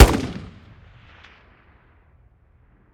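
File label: weapon ar fire player atmo exterior; gmod; viper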